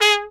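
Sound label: Piccolo Trumpet staccato G#3; DirectWave; Varazdin Orchestral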